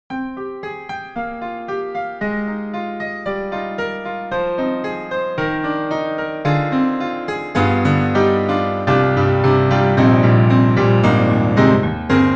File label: Truepianos Sapphireped Module Roomy; 4Front